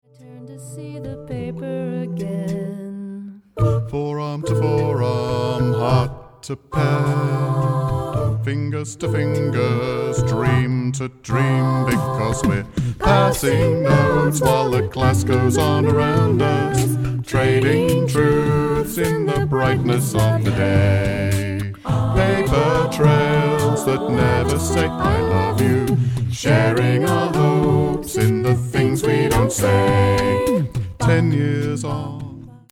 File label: Passing Notes